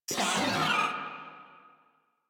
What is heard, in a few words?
Moa Vocal Pain, Enemies; Warframe